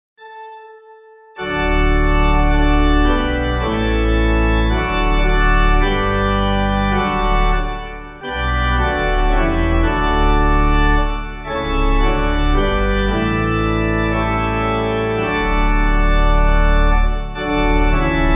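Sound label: Pipe Organ, Music Backing Files for Churches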